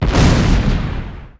kaboom

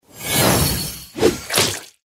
Battle Healer Cake Throw

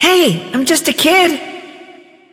hurt vo; denglong gus; 白玉走格斯; 荒野乱斗; 东方传说

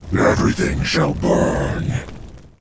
Hero fire lord warcry, en

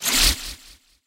flyback; 露米; 神话

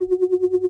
bleep

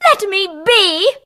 hurt

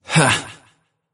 laugh

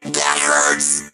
mech hurt

